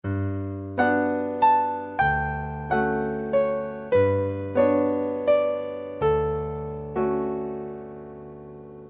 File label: gymnopedie, piano